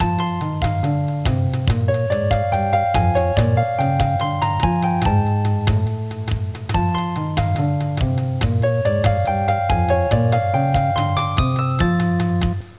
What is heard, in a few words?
LoadSave Screen; freddi fish and the case of the missing kelp seeds